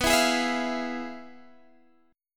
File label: F Minor Major 7th Flat 5th, B